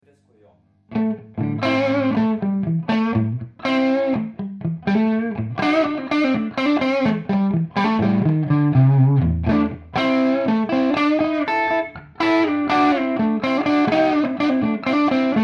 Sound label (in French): JMP entrée II Squier 51 position manche Texas Special